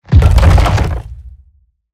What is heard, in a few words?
Roll Surface End; Evolve; Behemoth